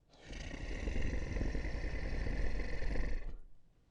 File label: Long snarl